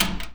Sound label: SWITCH, household; AKAI Anime Sound FX